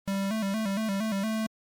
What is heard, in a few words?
ringtone